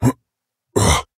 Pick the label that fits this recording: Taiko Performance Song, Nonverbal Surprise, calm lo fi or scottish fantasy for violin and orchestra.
Nonverbal Surprise